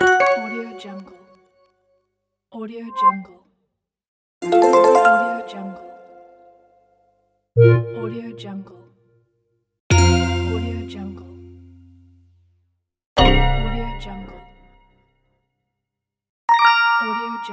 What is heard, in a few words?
ui sound effects pack